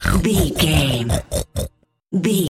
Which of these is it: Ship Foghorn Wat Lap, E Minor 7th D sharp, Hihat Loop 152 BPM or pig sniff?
pig sniff